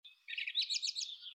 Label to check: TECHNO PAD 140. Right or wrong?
Wrong